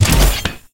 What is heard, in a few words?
Pickups